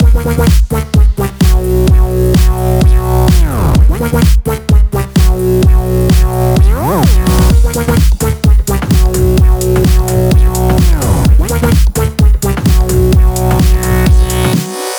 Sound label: 128BPM Wobble House Mix